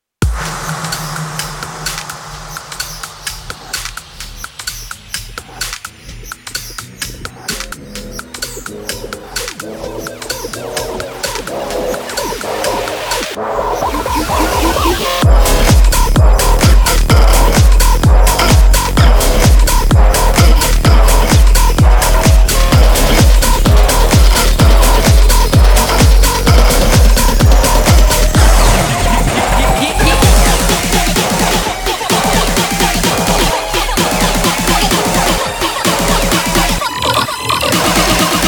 grand bass road

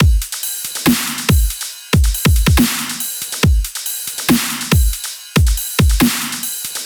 140BPM Dubstep Drums Loop

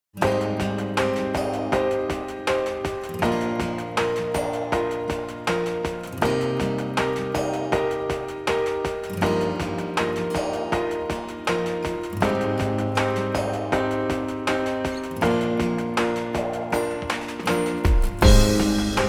instrumental

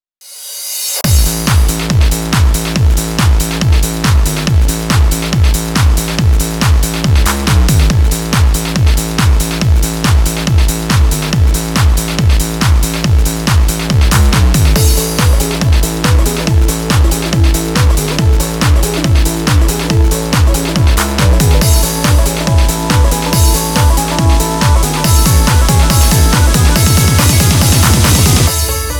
Off Vocal

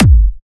Kick

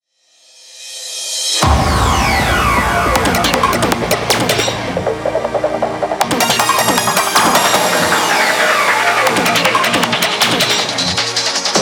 Eurobeat Remix

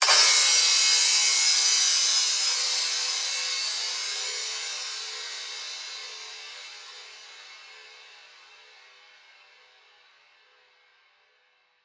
Crash

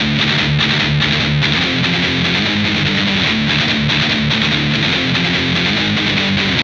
Guitar

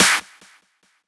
Clap

